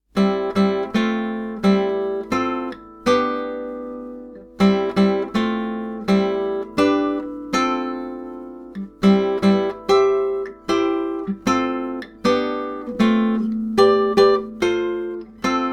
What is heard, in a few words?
major minor